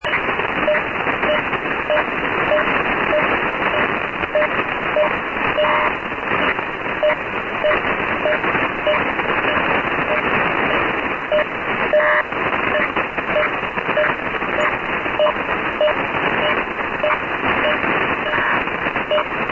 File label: 6940 kHz Link11 USB mode